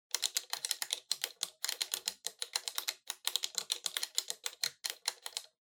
Soroban Fast

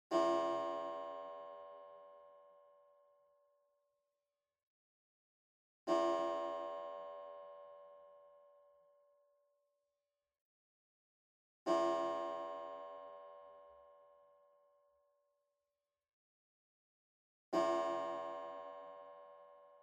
Pendulum Clock Chime Far Set

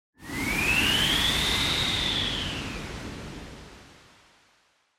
Wind Synthetic

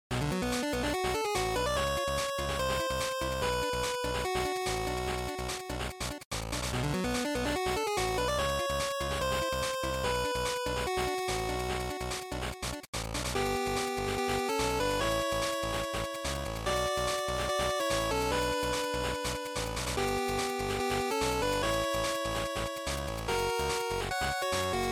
GB Fighting Stage

